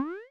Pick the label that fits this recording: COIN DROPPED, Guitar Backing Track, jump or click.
jump